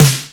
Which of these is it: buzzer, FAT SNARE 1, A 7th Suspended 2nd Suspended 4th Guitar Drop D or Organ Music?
FAT SNARE 1